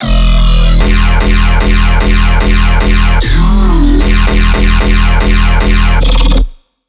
E 150, Dubstep Bass Loops; Throwin'Knees

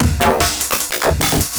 Dubstep Fill, 150bpm; Ghosthack; Dubstep Sound Arsenal

